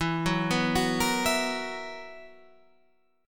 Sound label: B Major 7th Suspended 4th E